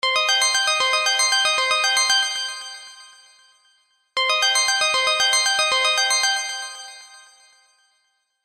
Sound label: Iphone Ringtone